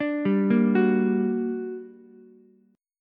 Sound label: G Minor Major 7th G, Ukulele; arpeggiated; JGuitar